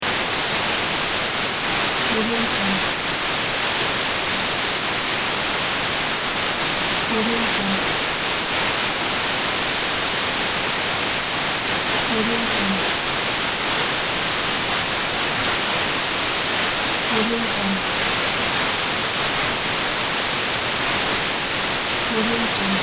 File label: white noise